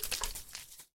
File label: Shake Empty Can